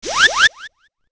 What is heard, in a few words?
Instant Messaging; Siemens; CX75